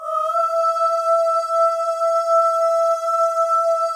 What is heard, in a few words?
OH, FEM, Symphony of Voices